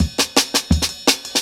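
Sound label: Jungle Breaks, 168 bpm; Original Jungle Breaks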